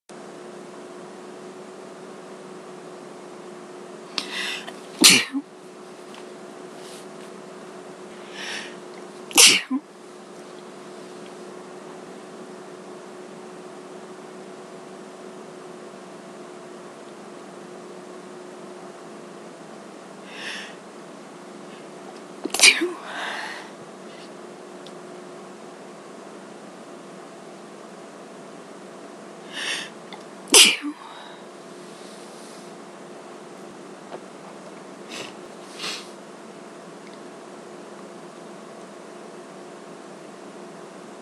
more spring allergies, female

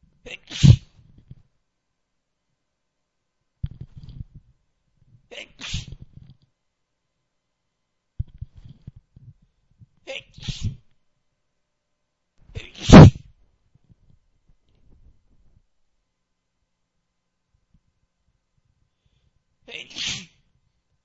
sneezy, male

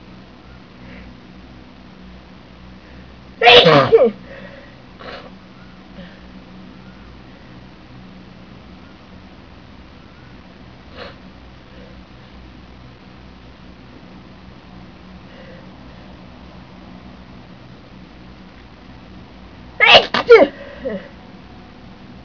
damn cold, female

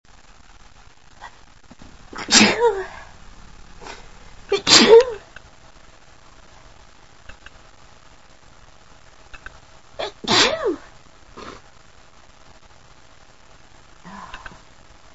dusty boxes, female

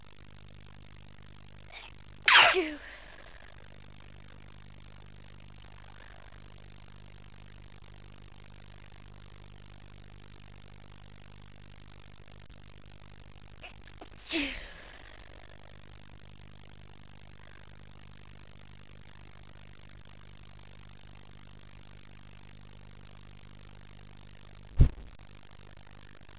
soft couple

